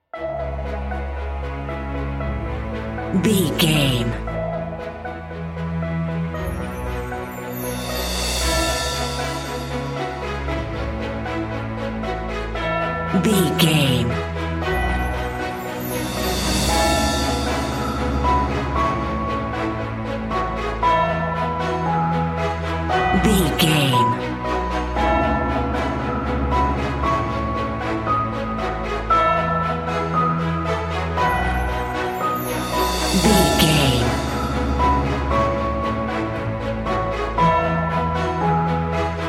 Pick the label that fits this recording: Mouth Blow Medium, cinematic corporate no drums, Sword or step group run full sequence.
cinematic corporate no drums